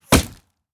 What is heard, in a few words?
wood bullet impact; Rust